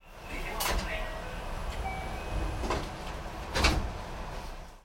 train

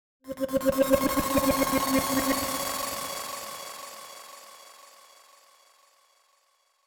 bike

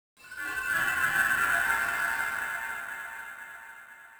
ghost

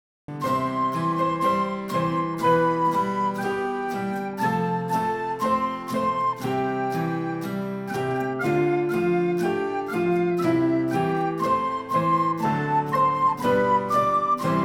jingle

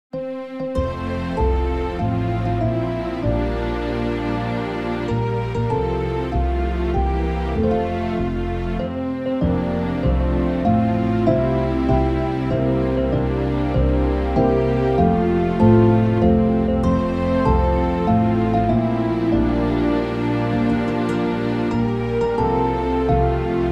happy

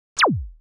erase

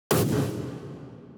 gun

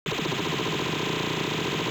machinegun

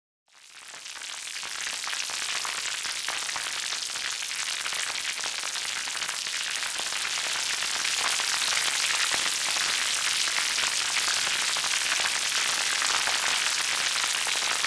rain

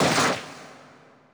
crash

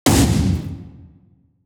gun

